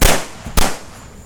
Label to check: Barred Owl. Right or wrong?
Wrong